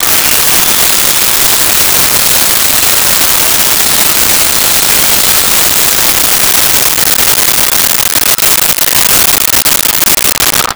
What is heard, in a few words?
Circular Saw; Tools; 1001 Sound Effects